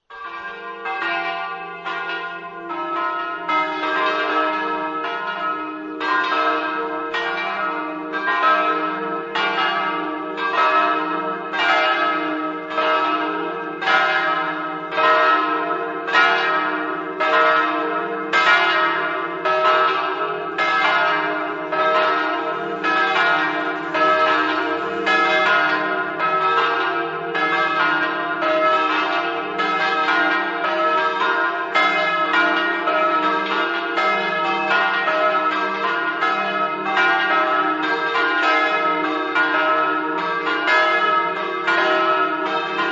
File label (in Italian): vendoglio, campane